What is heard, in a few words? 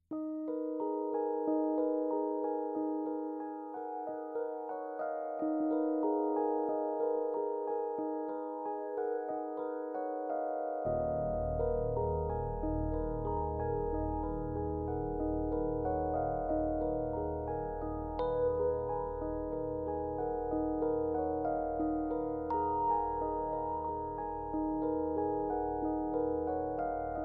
A Silent Wood version Fender Rhodes